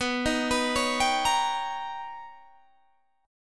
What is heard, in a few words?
B Augmented Major 9th B, chord